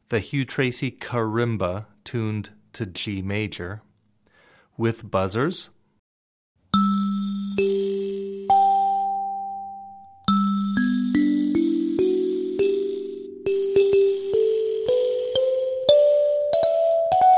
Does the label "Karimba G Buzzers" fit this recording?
Yes